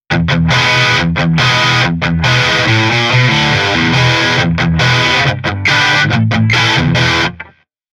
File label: Rhythm Guitar; GR2 Workshop